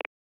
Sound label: Keypress Return